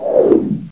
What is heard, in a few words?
whoo; Stanley's Sticker Stories